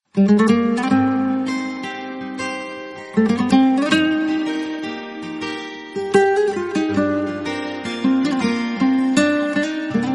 boarding music

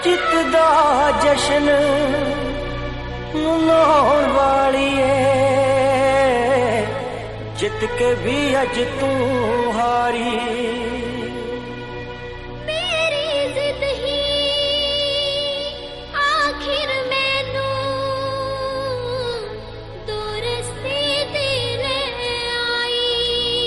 song punjabi

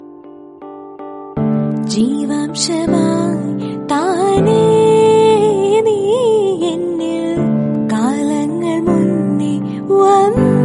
female version song

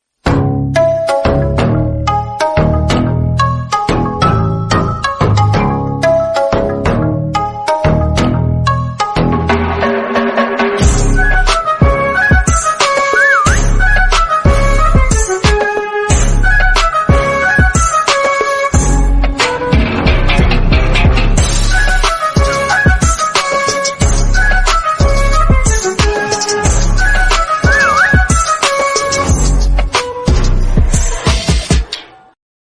korean instrumental

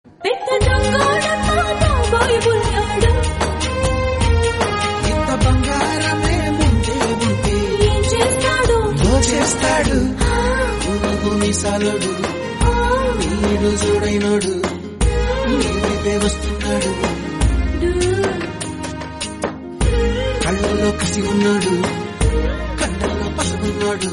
telugu song; bhale bullodu

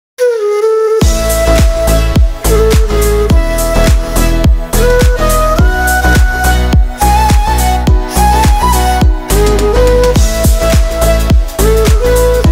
Flute Ringtone

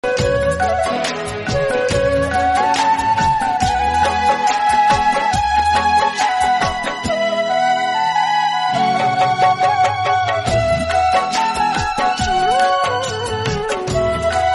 sad instrumental hindi

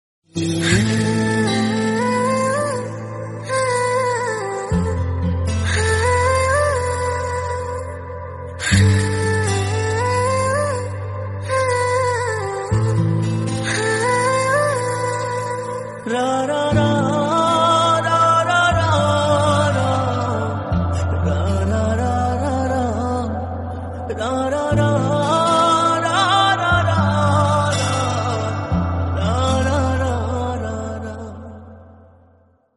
bengali bgm; crash natok

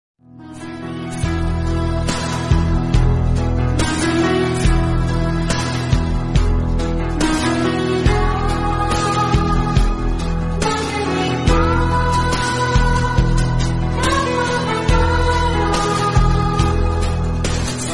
flute bgm; jeans

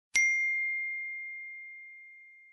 bell sound notification tone

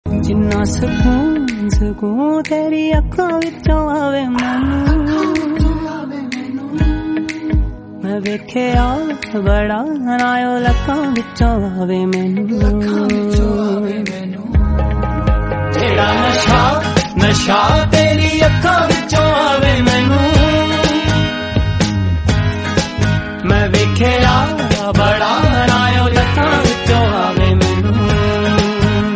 punjabi

